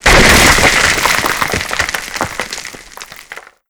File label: ceiling rock fall break; Call of Duty Modern Warfare